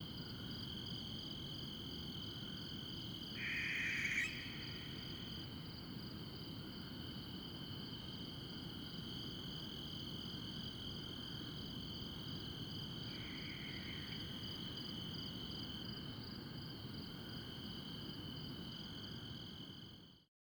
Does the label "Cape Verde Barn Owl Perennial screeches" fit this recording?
Yes